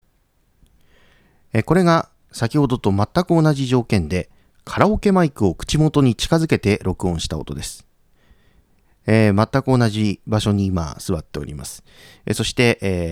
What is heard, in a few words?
karaoke